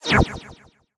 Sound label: staff fire; smite; ahpuch skin10